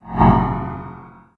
whirlpool trident swing; smite; poseidon

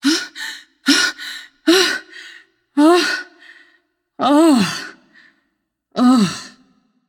nv breath; smite; voicepack; morgan le fay

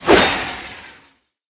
swing; smite; god daji skin15

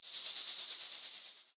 snake hiss; smite; medusa

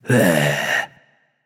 nv tongue; smite; voicepack; hachiman

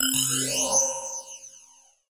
Step; smite; cupid

